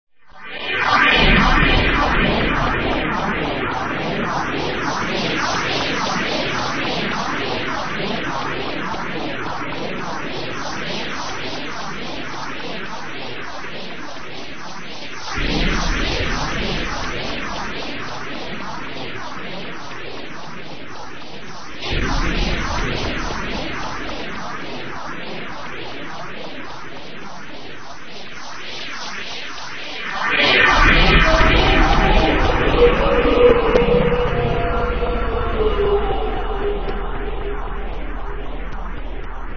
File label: space scream